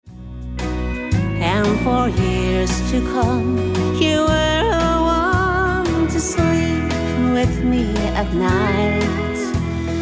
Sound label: country parlor